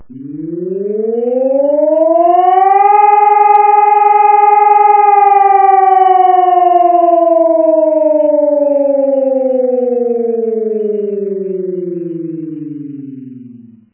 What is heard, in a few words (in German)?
probe, Information und Warnung; notfallvorsorge; information und warnung der bevoelkerung